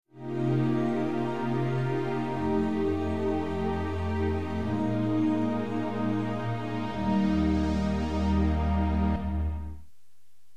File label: Good feel strings